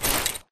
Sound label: Grunt Mvmt FOL Soft Short; Titanfall 2